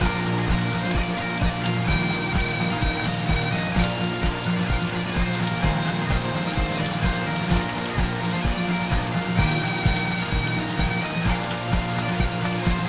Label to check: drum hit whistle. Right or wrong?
Wrong